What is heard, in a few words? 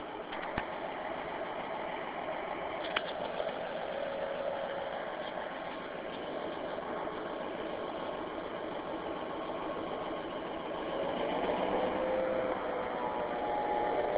Nohab sound